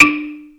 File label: ZYLIMBA; Greytsounds VOL-1